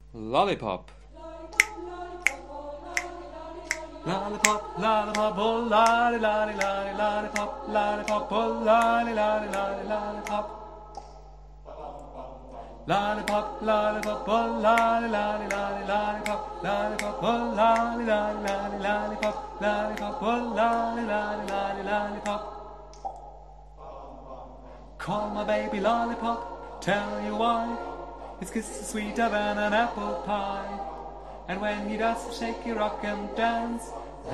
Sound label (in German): Sopran